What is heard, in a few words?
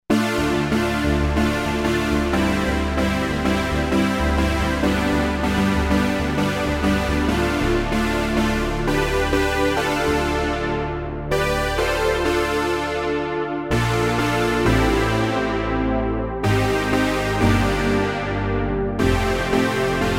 Big Saws